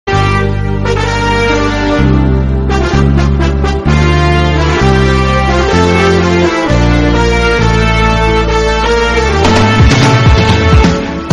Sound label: srilankan countdown